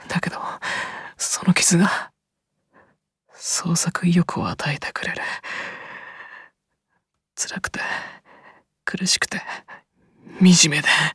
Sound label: YAY